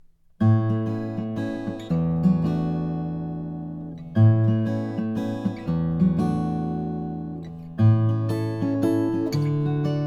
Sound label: froggy bottom m deluxe german; 145 year old